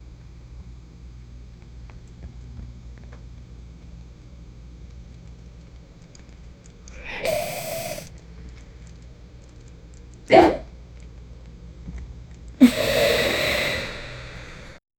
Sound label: belly inflation